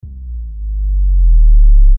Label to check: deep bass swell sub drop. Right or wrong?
Right